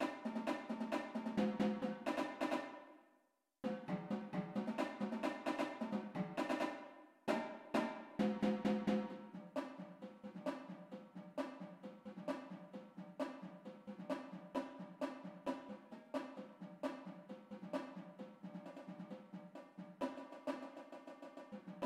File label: tenors, drumline